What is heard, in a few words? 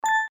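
very short sound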